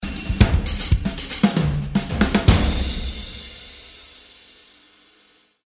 repeating drums message tone

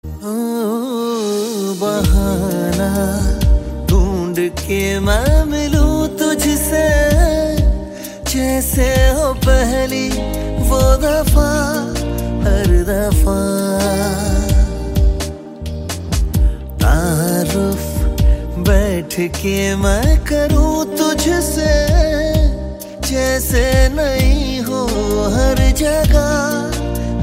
romantic hindi song tone ringtone; bollywood ringtones

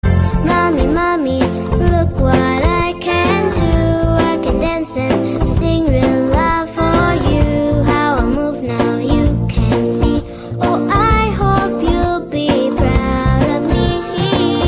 cute song tone ringtone

